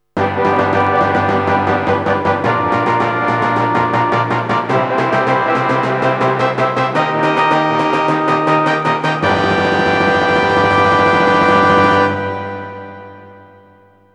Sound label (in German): zirkusmusik fanfare tusch